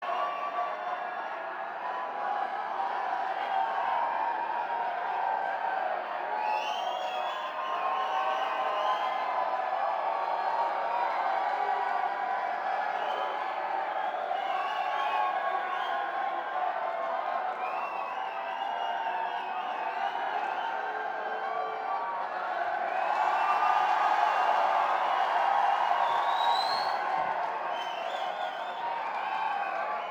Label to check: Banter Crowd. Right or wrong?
Right